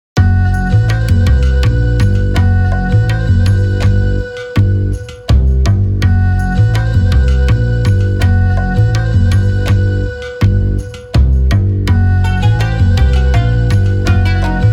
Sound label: bgm ethnic; maou